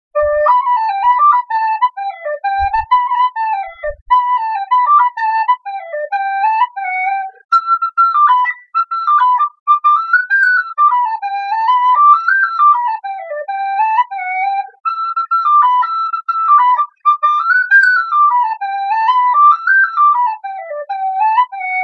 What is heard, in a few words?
ocean sweetone